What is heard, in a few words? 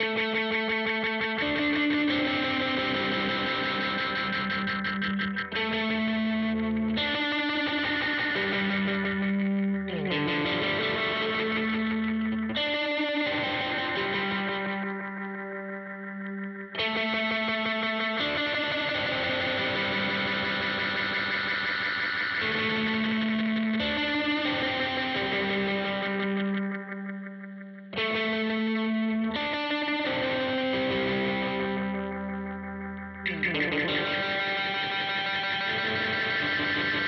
Feedback Gain; Boss DM-2w